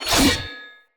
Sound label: Dagger Heavy Whoosh; Weapons; Dendrit